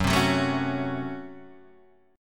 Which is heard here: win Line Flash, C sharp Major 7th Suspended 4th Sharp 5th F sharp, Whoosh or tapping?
C sharp Major 7th Suspended 4th Sharp 5th F sharp